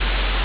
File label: ambience room tone